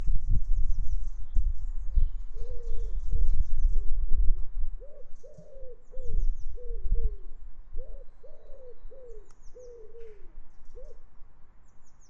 more birdsong in glorious stereo with clock striking the half hour